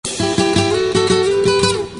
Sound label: melody